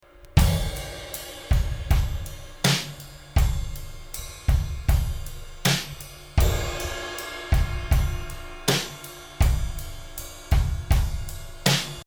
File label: drums dry; drum beat